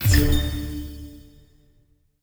Ui Reward; Forza Motorsport 6 Apex

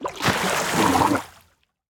Swamp boss Tail show

